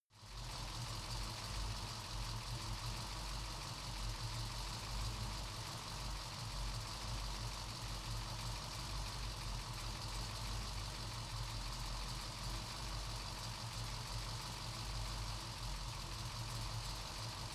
car camaro idle engine

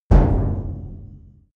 medium metal impact

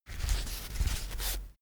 toilet paper sheet handle fold, badezimmer; haus-garten

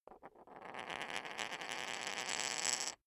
poker chip spin; soundpool

